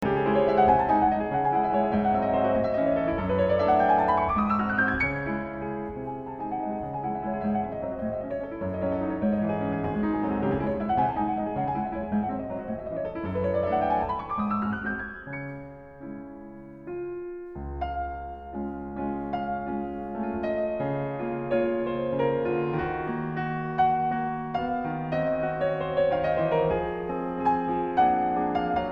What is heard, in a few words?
Waltz in C Minor